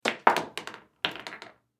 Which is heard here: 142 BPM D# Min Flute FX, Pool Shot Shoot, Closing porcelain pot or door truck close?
Pool Shot Shoot